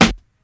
dry acoustic snare sound a key, steel snare drum tuned to the A note; snare-drum-samples